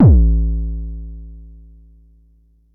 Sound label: Bassdrum; Jomox Xbase09